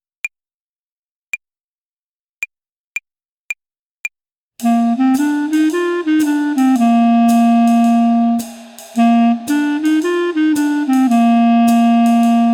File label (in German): Phrasierung Übung, Play-along, Jazz Club Klarinette